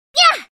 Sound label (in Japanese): 通常攻撃（ショートボウ・空中）「やぁ！」